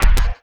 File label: Zappy Kick